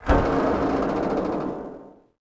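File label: crane arm spin large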